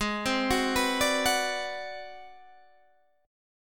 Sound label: G sharp 7th Sharp 9th Flat 5th G sharp down Guitar DADGAD, chord